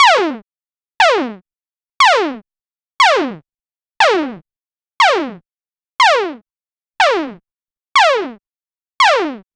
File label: pixel, arrow